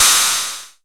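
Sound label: MS20 CRASH; INIS - Funky Xtreams; MS-20 KIT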